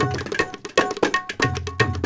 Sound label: India, Heart of Asia